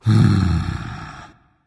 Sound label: content, voice; smite; voicepack; ymir